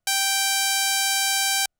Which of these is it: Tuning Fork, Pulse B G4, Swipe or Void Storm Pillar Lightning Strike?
Pulse B G4